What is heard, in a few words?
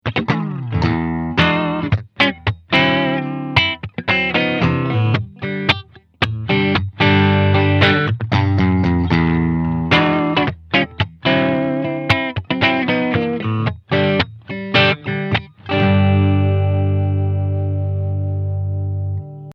Clean